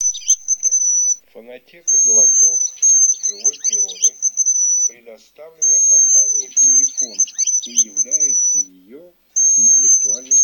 Рябчик Francolino; plurifon

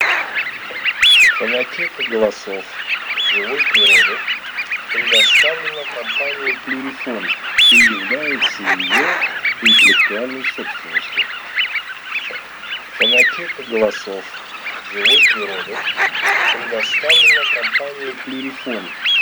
Свиязь Fischione; plurifon

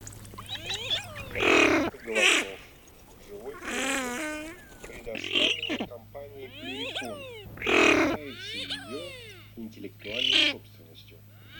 Красноголовый нырок Moriglione; plurifon

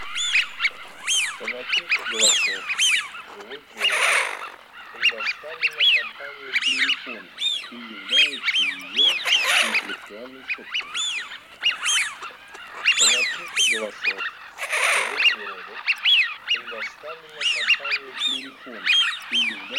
Свиязь Fischione

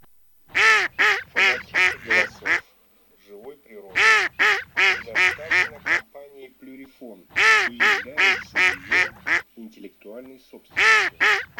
Кряква Germano; plurifon

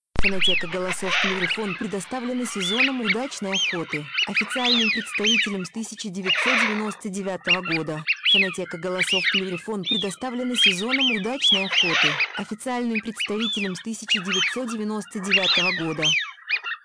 свиязь; plurifon

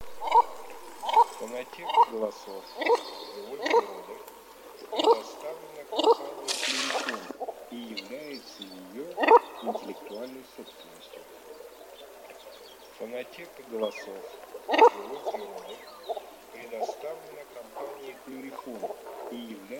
Клоктун Alzavola Asiatica; plurifon

